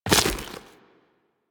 Run Goo, Footsteps; Warframe; Tenno

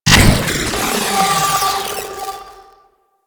Sentient Player Scanned; Enemies; Sentient Movement

